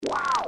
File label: sellection button sound effect; Sound-Graph